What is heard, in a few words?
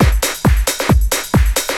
loop; SoundFactory; SMPMANIA